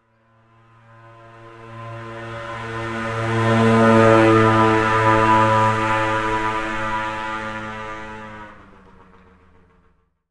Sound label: transport motorcycle scooter pass by slight distance suburban street